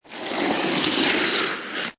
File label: Snow And Ice Ice Or Hard Snow Grate Long, metal on ice; Ice Sound Effects; Sound Effects:Weather; Lynne Music